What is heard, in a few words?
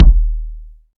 long bass drum one shot d sharp key; kick drum